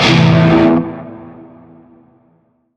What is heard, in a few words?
Shawzin Pent Maj Chord; Warframe; Narmer Shawzin